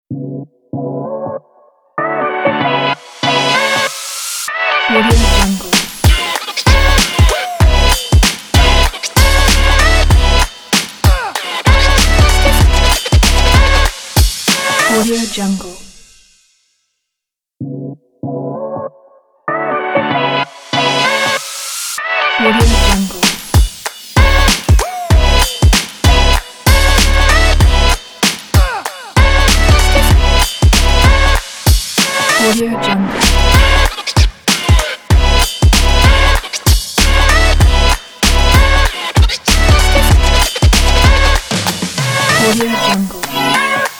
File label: hip hop